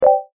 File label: Compound w Low Pass Filter; phet io wrapper sonification; molarity